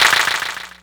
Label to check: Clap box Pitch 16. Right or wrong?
Right